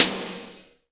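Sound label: snare drum; AKAI S6000 CD-ROM - Volume 1; DRUM RYTHM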